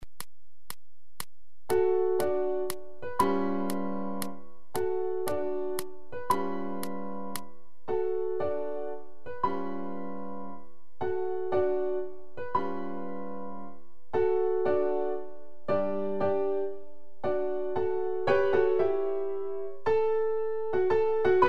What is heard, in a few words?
Waltz 120; Uebungs-CDs; Playbacks; Seiber